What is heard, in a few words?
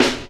Snare, Percussion Hits, Snares